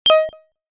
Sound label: Message Tone